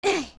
young ledge climb; Link climbing up a ledge

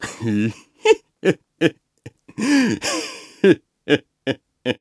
Laugh Teasing; laughing, teasing; Groose; Link; Skyward Sword

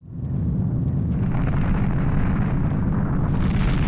Temple Of Time Crumble Start; Zelda; OOT

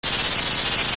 Shock; Biri; zelda; OOT

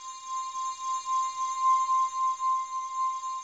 Spiritual Stone; Zelda; OOT

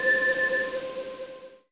Warp End; Chamber Of Sages; zelda; OOT

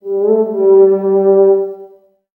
King Bulblin Horn; King Bulblin's horn blowing; Eldin

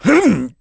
Sumo Push

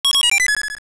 Sword Clone Cancel; Canceling clones

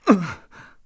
Ledge Grab

